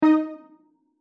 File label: SE sys pop cancel